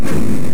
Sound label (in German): explosion, Die Frequenz ist eine Sinuskurve mit einem starken Rauschen.; commando 7800